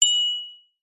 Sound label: multimedia alert notification basic bell synth positive ping; zapsplat